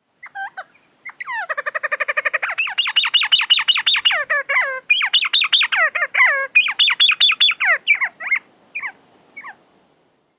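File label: Northern Bobwhite alarm; bird language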